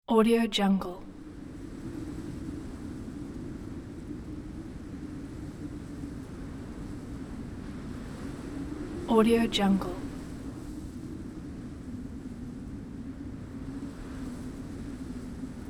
soft wind

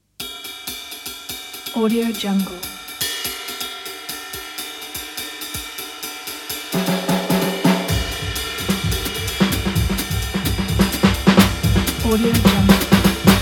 the jazz drums

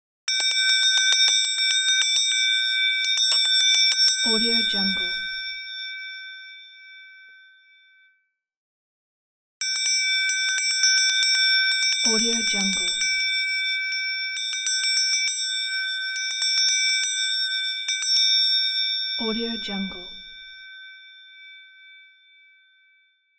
bell ringing; audiochemicals

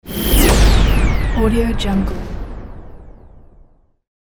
big bang

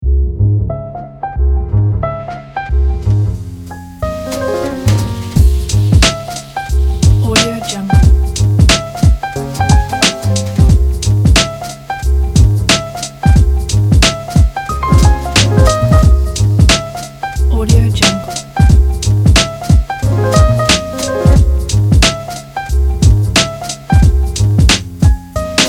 jazz hip hop; playstarz

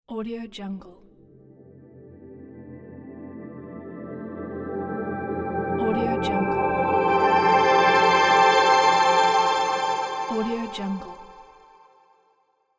elegant logo appears on the screen

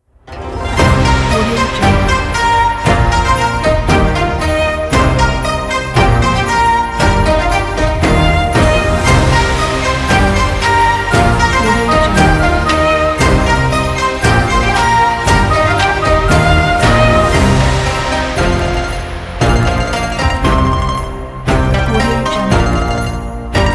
lunar new year

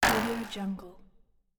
scifi interface negative notification

